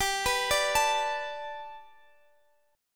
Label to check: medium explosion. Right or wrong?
Wrong